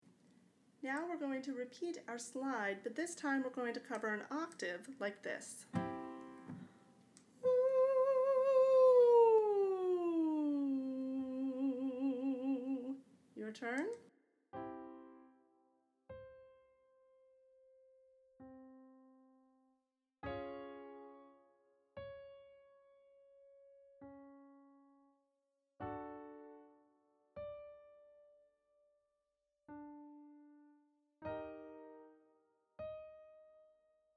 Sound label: singing slide